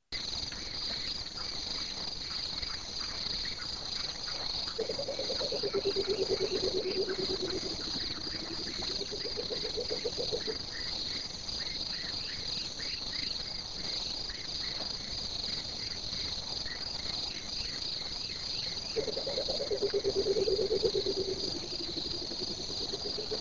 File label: Night Sounds Coucal